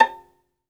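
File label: solo violin, strings; ILIO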